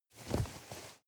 nylon leather very soft

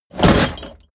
box containing metal drop

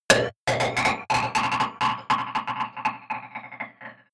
hit confused

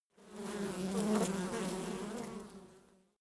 bee buzz

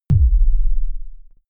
sub kick noise soft

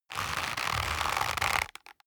leather creak medium

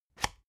spring binder unlock

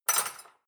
dishwasher cutlery place

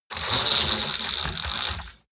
roll-down curtain roll down

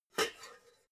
pots clank quiet

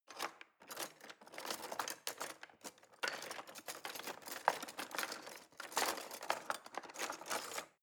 knives wood drawer handle rattle long

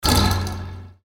bed spring

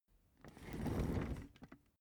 office chair trundle slow

